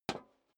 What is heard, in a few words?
step high heels metal dry single stair down; schritte